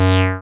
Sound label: SYN TBM G3, MC202; TB303+MC202; Sound & Vision - Gigapack I CD 2